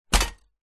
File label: office slide projector vintage set down carpet 1960 voigtlander perkeo automatic; zapsplat